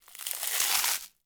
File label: Green Onions Frozen Crunch; Mattia Cellotto; Crunch Mode